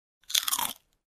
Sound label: Food Bite